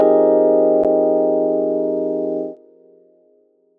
piano stab

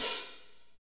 HAT, Roland; Northstar - Drumscapes Roland

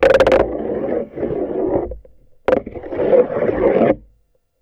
In The Bath, Marbles, contact mic; musicradar; contact mic madness; Raw

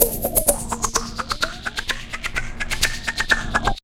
127 PERC, PERC LOOP, Fresh Disco House; USB Soundscan vol.20 - Fresh Disco House I

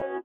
SITAR; Spectrasonics; Metamorphosis; INDIATEK

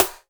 SD AMBI, Akai S6000, dance

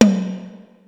Tom, Yamaha SY22

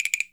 HIGH, PERC, Percussionworks; Sampleheads; NYC Percussionworks; 90 s Sample CDs

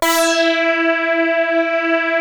Prophet 5 E5, Synthesizer; Hollywood Edge - Giorgio Moroder Rare Synthesizer Collection

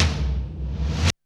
REV TOM MD; Back In Time Records; Big Bang; ST.REV.TOMS

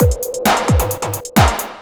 Beat, Drums & Percussion; Electrocution; Beats

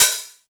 sfx perc

